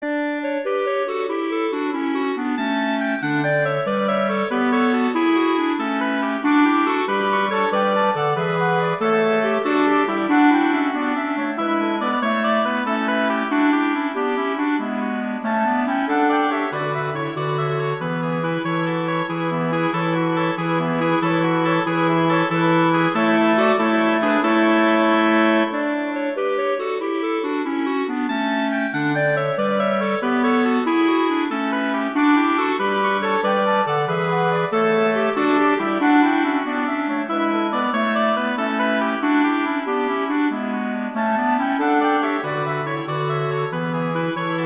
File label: Gigue; Pachelbel; Canon